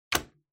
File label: Hole Punch Put Down